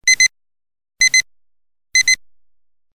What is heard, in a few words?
beep